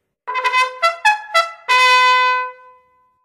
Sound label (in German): Halbrechts drehen